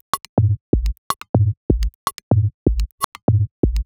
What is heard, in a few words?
Bigboom Top C 124bpm, House Drumloops; Zero-G House Fabrik; 90 s Sample CDs